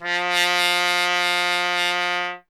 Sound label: TP F; Miroslav Vitous - Solo Instruments VOL-1; Trumpet